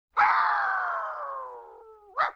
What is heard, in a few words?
LoFi Synthetic Dog Squawk; Vintage Cartoon; Comedy Vocals